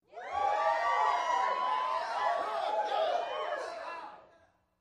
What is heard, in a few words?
Crowd 20 Rowdy Short, small int